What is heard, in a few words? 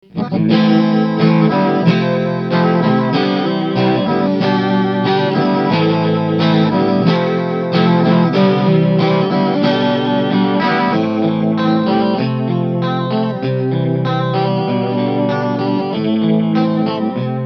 Chorus mode, modulation, VB-2